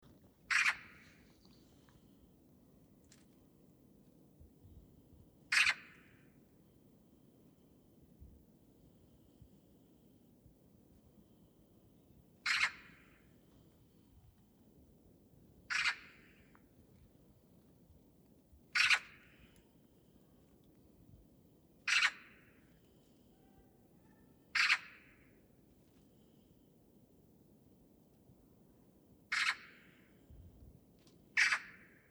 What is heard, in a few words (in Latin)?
Pica pica; SETE